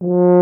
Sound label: tuba F; Miroslav Vitous; Solo Instruments VOL-2